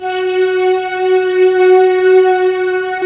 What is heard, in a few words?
SYN MORP, Roland; Sound & Vision - Gigapack II; Morpheus